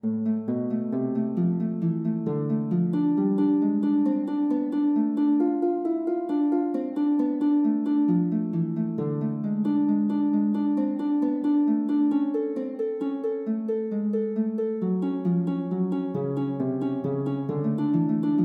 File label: courante, lute